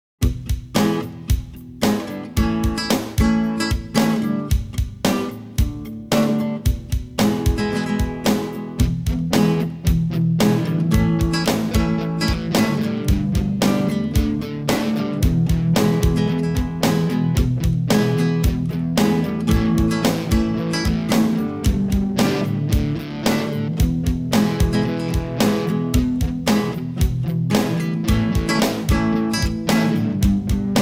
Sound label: rock pattern